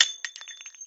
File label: casing; silverbullet